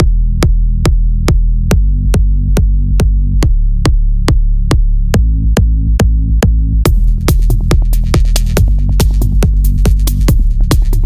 High Alert

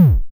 ground punch out

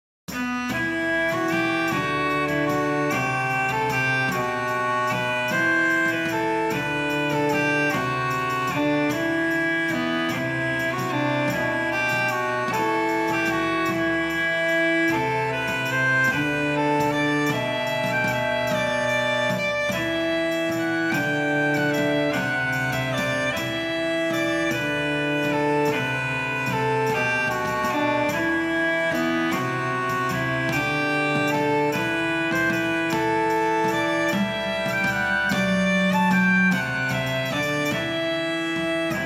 Middle age RPG Theme

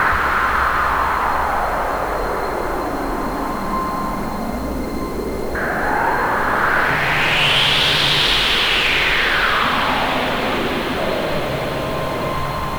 arctic ambience; space captain yanya original soundtrack

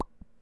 pop

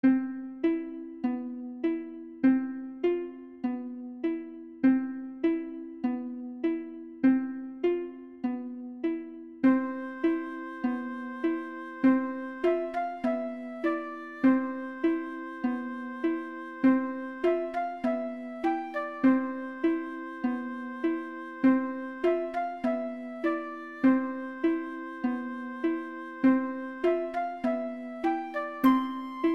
Calm Town Theme

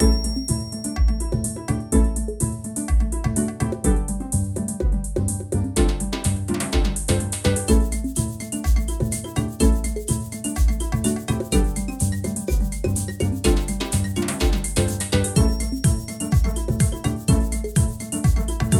course select; Apple Cider Juiced!

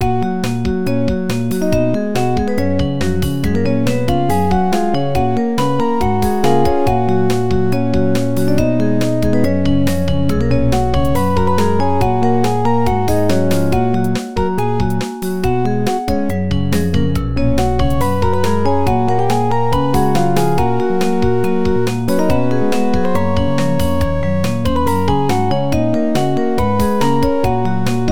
bgm

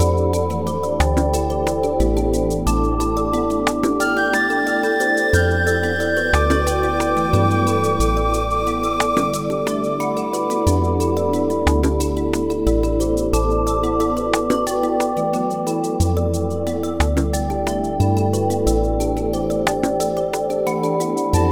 forest exploration variation loop

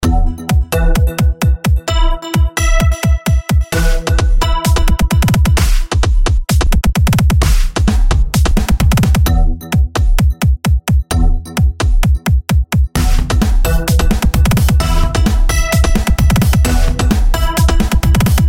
racket loop 128

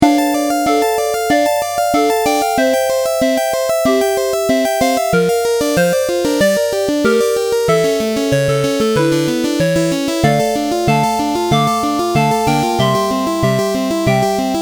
Gem Kings chiptune

